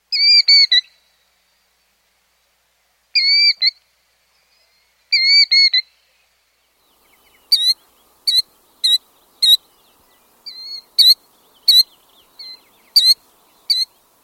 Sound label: killdeer